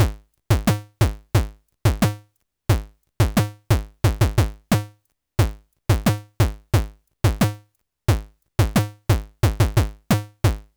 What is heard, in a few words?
kick